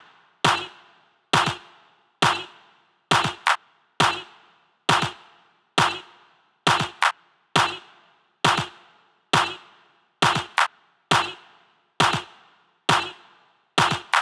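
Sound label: Clap 135bpm G minor Analog Layered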